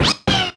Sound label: Attack sound effect